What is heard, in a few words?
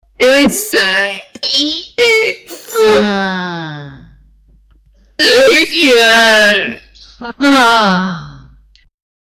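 a pencil drawing circle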